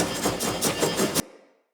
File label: Long Sword Throw Loop; Weapons; Player; Pothia Long Sword; Swords